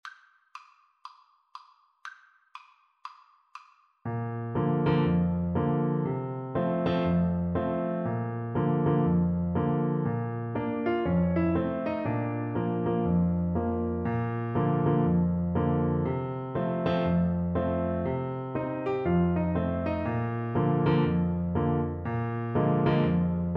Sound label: careless love, tuba